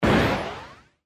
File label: Burst Airy